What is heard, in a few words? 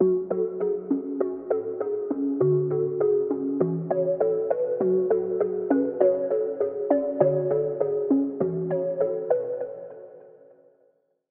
Pluck, Spire; Last Day; Routed Sound; Spire Expansion; Street Vanquisher Vol.1